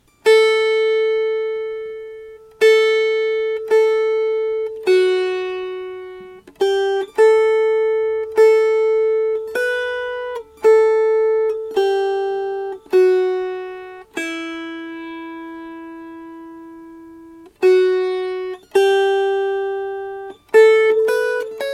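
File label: Melody, Dulcimer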